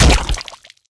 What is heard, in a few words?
hit